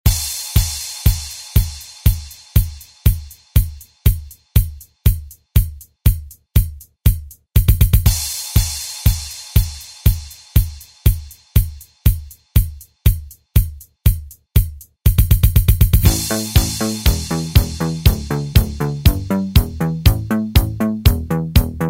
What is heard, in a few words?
Re Drum